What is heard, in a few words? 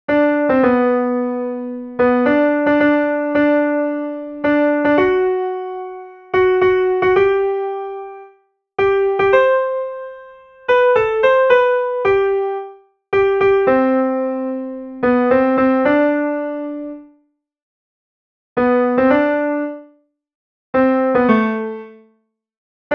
thirds piano